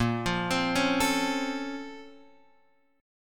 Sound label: A sharp Minor Major 9th, down, Guitar, Open F; A#mM9 arpeggiated; JGuitar